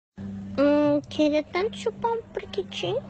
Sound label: hmm; priquitim